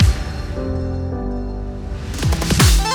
Snow Transition; sky rogue

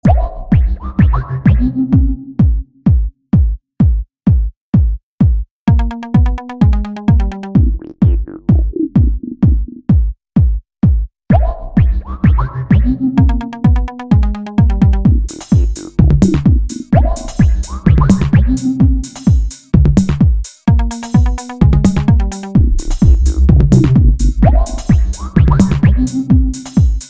Glitch House

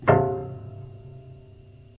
piano effect

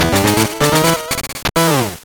Match Start; NBA in the Zone 1999; gbc

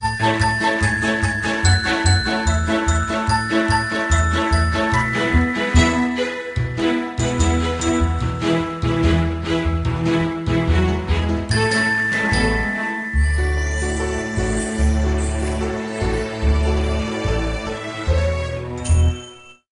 House Music; Yard Sale Hidden Treasures Sunnyville; NDS

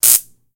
Bug Zapper

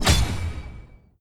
UI Pvp fight; Blue Guardians

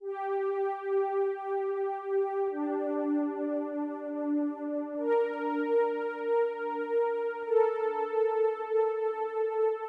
Synth Brass 4 Bars; Vostok Inc.

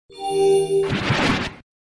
Enemy Skeleton Revive

